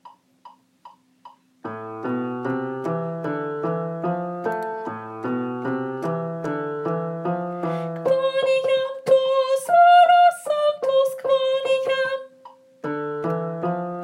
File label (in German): Gloria, Tenor; Tenor (Teil 3); Messe; Chor